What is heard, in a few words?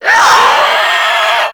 Infested Crawler Attack Short; Warframe